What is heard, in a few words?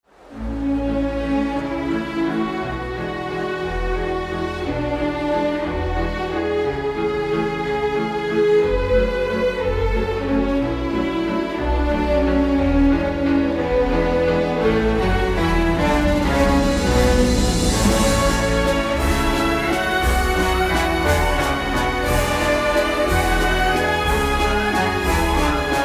Waltz; Skaters